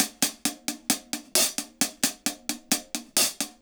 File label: HAT, HI HAT LOOPS, Dub; Drumdrops In Dub VOL-1